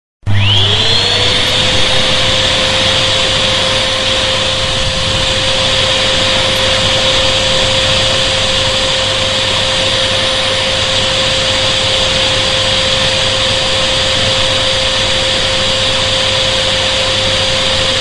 Odkurzacz; Chomik Heniek Wielka Wyprawa

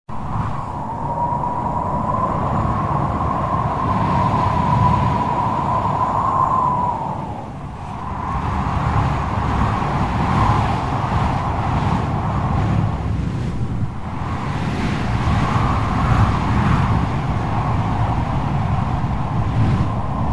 SILNY WIATR; Grudniowe Opowieści

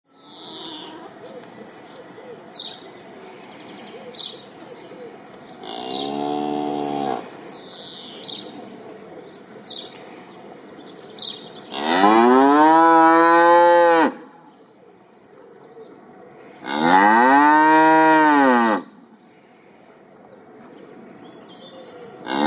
Krowa, na farmie

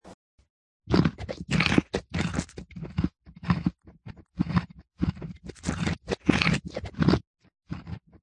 Chrupanie, koń; na farmie

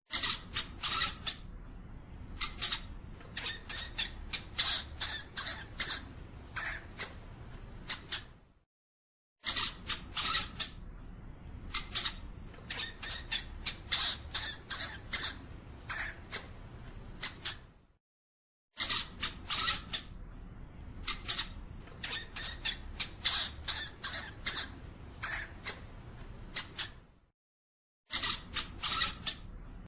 Chomik; Chomik Heniek Wielka Wyprawa

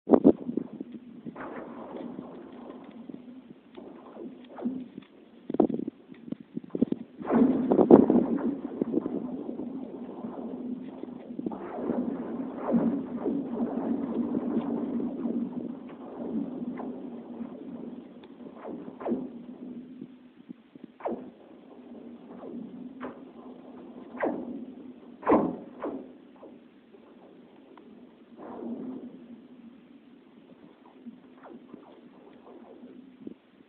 Odglos Lodu

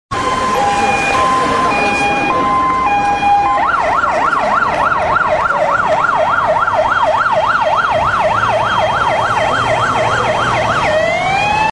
wóz strażacki; Grudniowe Opowieści

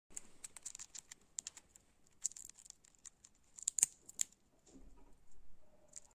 Chomik chrupie; Chomik Heniek Wielka Wyprawa

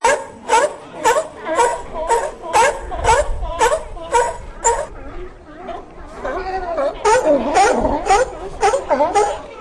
Odgłos Foka, Odgłos foki; Odgłos foki Funi

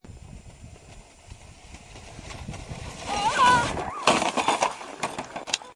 Odgłos zjeżdżania na sankach; Zjazd na sankach

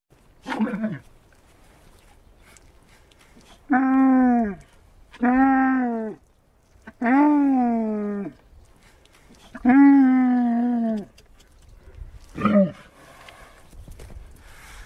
Odgłos Wielbłąd, Odgłos wielbłąda; Odgłos wielbłąda Waldka

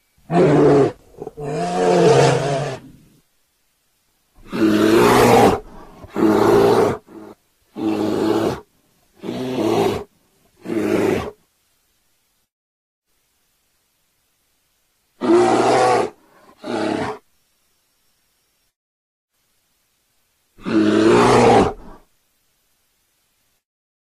NIEDZWIEDZ; Grudniowe Opowieści

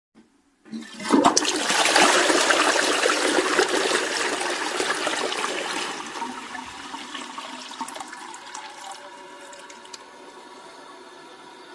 Spluczka; Spłuczka; Chomik Heniek Wielka Wyprawa

